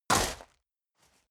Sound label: step boots gravel medium jump; schritte